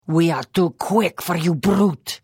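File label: Attack, Khajiit female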